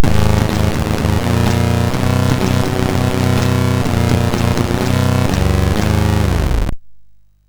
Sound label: sid MOS6581R2